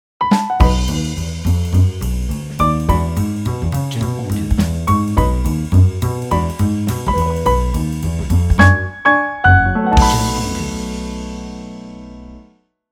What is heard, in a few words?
Jazz Piano Intro Logo